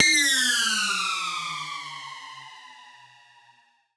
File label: Siren Horn down, Ramp Down, A; future rave